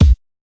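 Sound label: Bass drum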